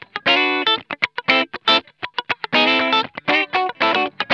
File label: SCRUNCH, Funk Guitar; Zero G - Funk Guitar